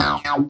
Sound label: 120 akai acid bounce, bass loops; MELODIC LOOPS